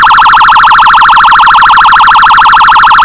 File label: Whelen Pierce